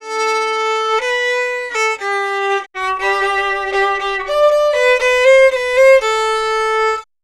Fiddle, Appalachian

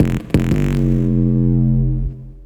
BASS, Drum & Bass 175 BPM; Ueberschall; Drum & Bass Flash Trackz

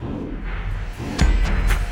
Elektro Stop, Drums; Sonic Foundry (Sony Creative Software) - Friendly Fire; Marc; Steve

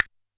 Castanet, Standard Kit, Yamaha PSR-E323

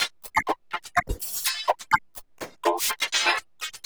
Granular, LOOP 125 BPM; Bolder Sounds - Granular

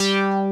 BIG BTM BS; Pro-Rec - Synthsonic

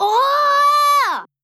Neneo HHHHHHhhhhhhh, vocal

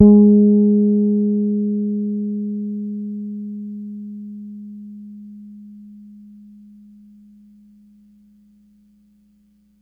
As G#4 hard, Bass, Pedulla Thunderbass; Giga Samples Collection

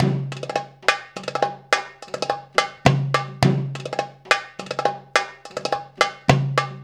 PERC LP, Arabian Traditions

